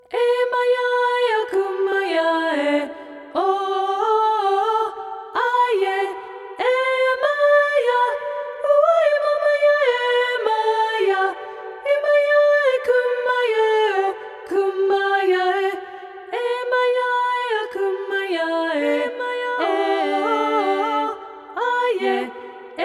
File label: rain dance alto